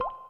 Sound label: raindrop